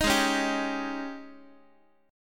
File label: G sharp Major 7th Suspended 4th, Guitar